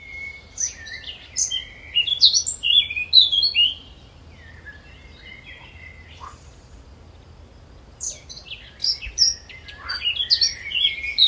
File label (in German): sound of sonnenhausen